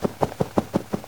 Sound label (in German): rabe flattern; SCHORNSTEIN